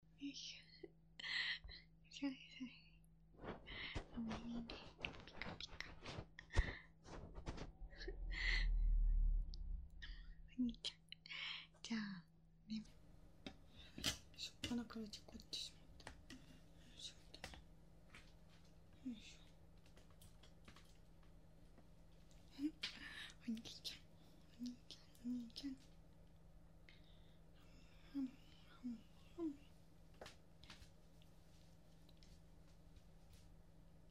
妹の耳かき

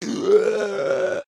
魔物 ダメージ

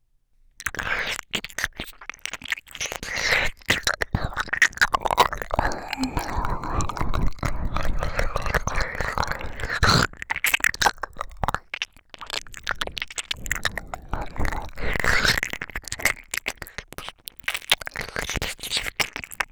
強めのすすり上げ耳舐め

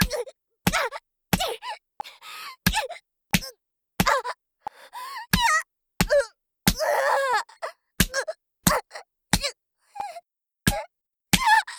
ジャブメト BPM90 ワンツースリー, メトロノームあり

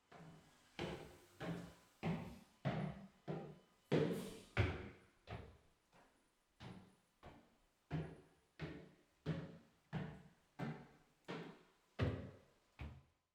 立体駐車場の階段, 足音; 足音系

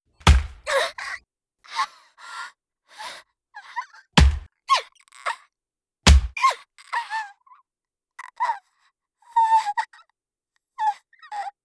みぞおち

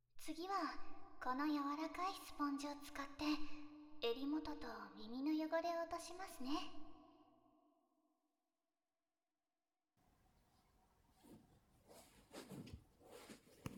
スポンジで優しく耳洗い, ASMR

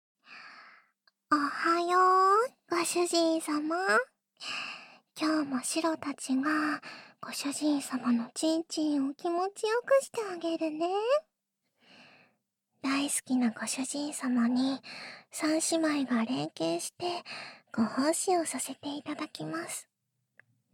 寝起き耳舐めフェラチオ♪; 猫耳オナホペット三姉妹を買える未来がやって来た!; ダチュラスクリプト; キャットシスターズ

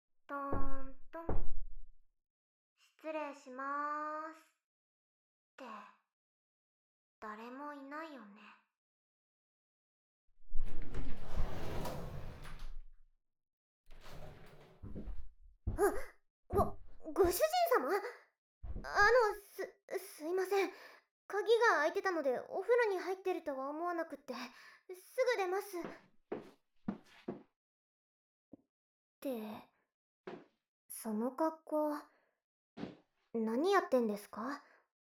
ヘアカットとシャンプー; 3DASMRメイドシリーズ

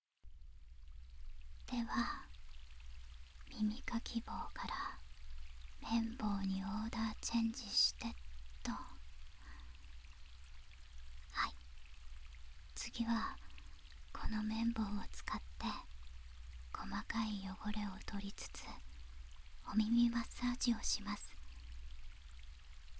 綿棒（左）, ASMR

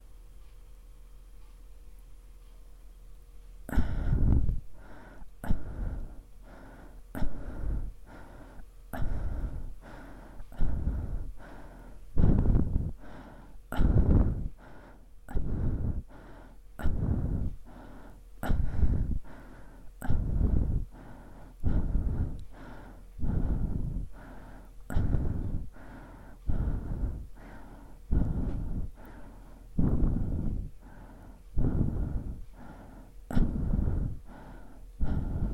息遣い

